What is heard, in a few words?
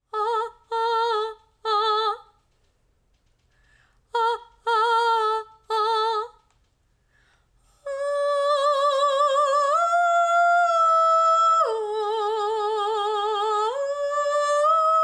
Orc March Choir